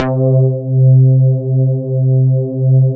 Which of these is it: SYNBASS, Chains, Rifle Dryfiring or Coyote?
SYNBASS